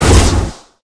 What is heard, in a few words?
swing; criminality